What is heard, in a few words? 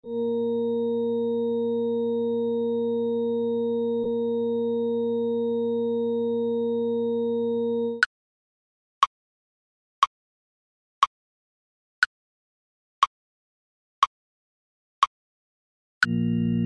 Aural Skills warmup backing track; Curriculum Support Resources